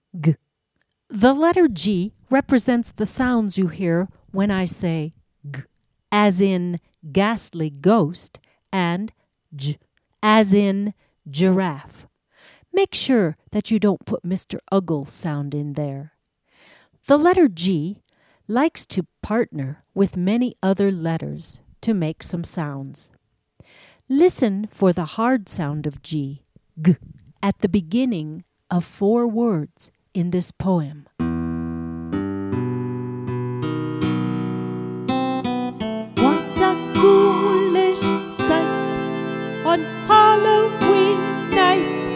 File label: Spook Cuica